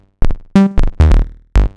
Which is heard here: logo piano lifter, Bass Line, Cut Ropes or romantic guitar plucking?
Bass Line